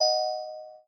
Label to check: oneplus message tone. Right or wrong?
Right